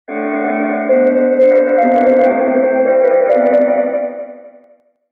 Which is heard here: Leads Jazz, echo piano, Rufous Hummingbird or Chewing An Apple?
echo piano